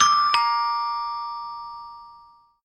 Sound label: ding dong bell doorbell; salamisound